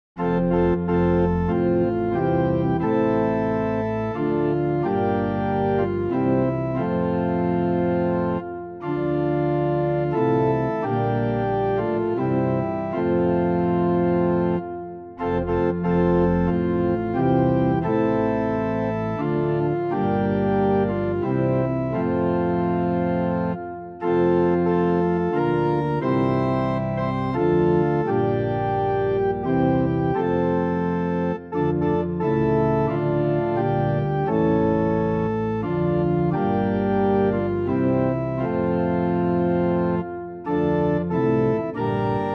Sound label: Pitch 440 Temperament Equal, Amen; hymn